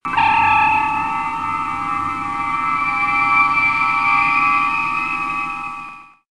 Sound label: sci fi transporter